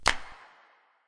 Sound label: real verb real; clap; Claps